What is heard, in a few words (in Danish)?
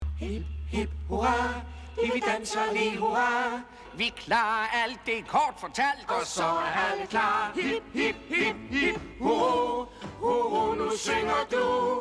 hip hurra; fragglerne